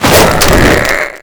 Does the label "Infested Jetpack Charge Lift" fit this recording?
Yes